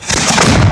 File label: Explosion Double